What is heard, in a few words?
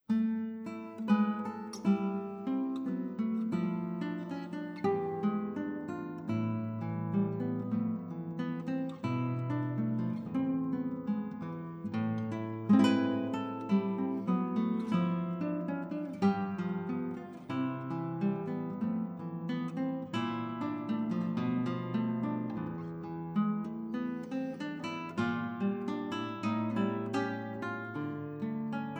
clarinet and guitar